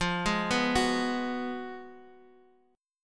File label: F sharp Major 7th Suspended 2nd Suspended 4th, F